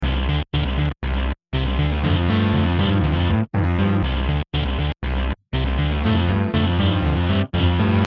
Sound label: background music